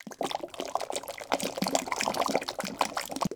Gumbo; Levels Hub Diner; The Princess and the Frog; Wii

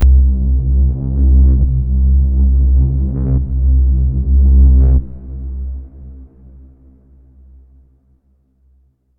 Stinger Exploration Dirty

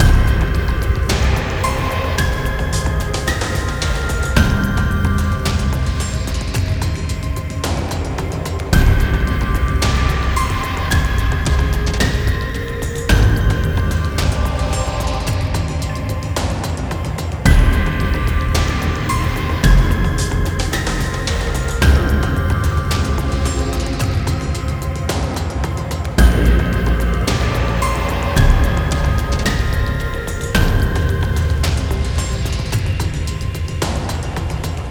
Dungeon World Idle LOOP

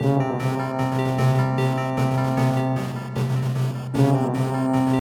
Star Chords

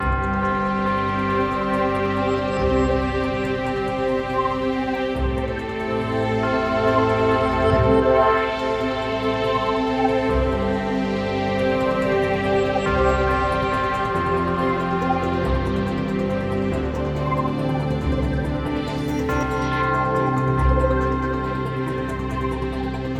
Player Neutral

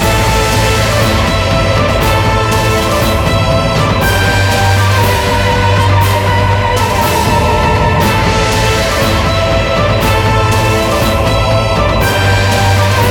TENSION LOOPABLE

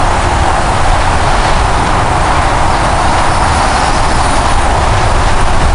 WIND; blood fresh supply

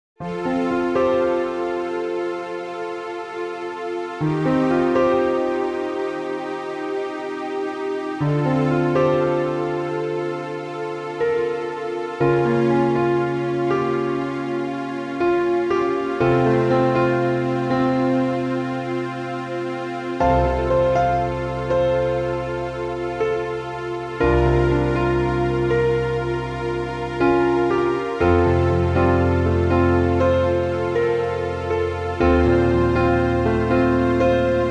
Boss Defeat; the king of fighters xv

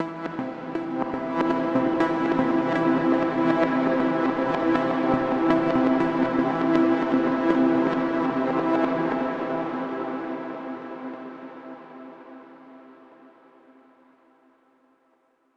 Overlay Tone

